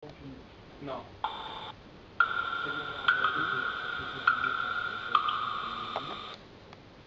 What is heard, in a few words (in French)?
eau